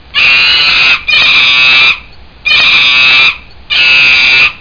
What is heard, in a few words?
chimp, AnimalSounds; Taifun